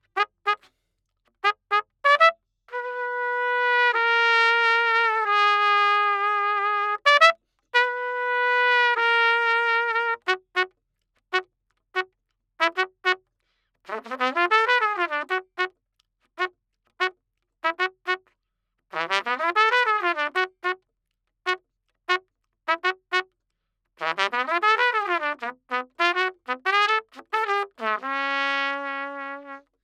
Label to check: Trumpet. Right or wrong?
Right